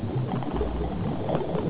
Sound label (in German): wasser